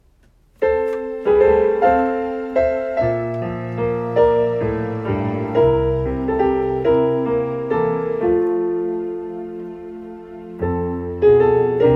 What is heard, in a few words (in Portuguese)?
playback hino